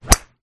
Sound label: sport golf swing club hit ball